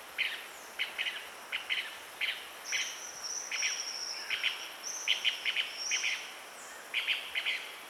Cichlocolaptes leucophrus leucophrus alarm calls spontaneous; Ricardo Parrini